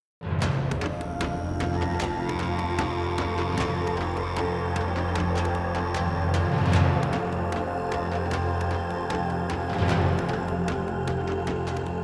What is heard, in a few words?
Boss Combat; Ori and the Will of the Wisps; kwolok